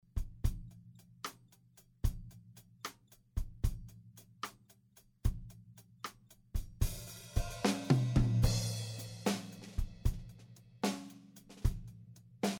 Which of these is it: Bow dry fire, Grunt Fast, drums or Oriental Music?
drums